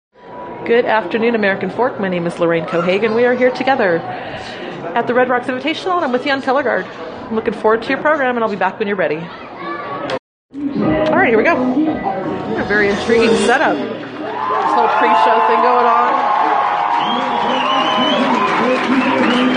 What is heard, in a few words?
marching band competition, color guard; umea red rocks marching band competition prelims; american fork color guard